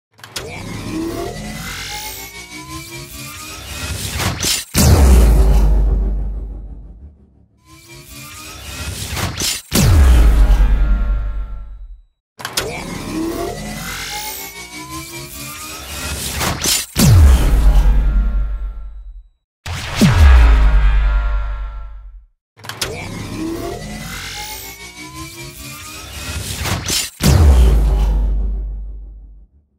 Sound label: Laser fire; شلیک لیزری